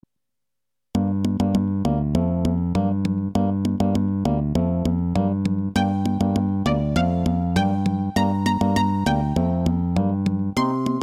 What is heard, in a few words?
karaoke